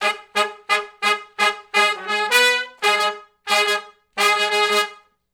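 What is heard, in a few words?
Funk Riff (Ab) unison, Horncraft for R&B; Sections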